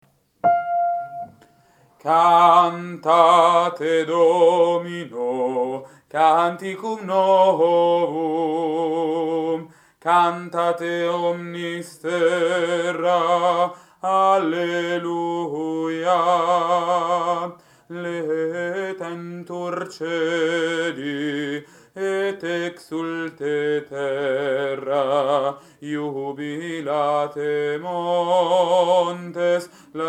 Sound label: Cantate Domino Contralti